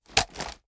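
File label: assault rifle drop down; weapons; gmod; viper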